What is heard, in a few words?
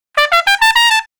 Trumpet Straight (E); Crimson Blue and Fabulous Horncraft 4 RnB; Horncraft for R&B; Solos